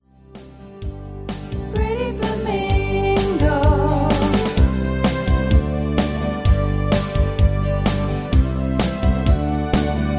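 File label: Instrumental No Leads background vocals; Singing Calls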